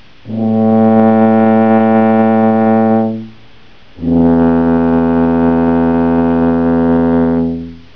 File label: tuba correct